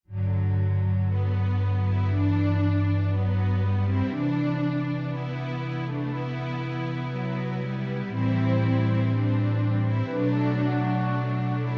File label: Elegy for Organ